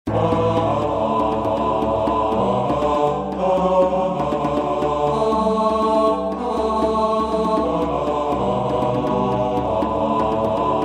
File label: D & F Men